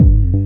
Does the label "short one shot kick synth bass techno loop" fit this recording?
Yes